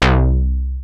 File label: FRONT, MONO-POLY; TECHNO-BWARE